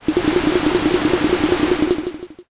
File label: carriage